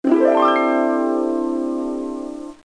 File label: Harp2